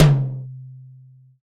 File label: huge tom one shot d key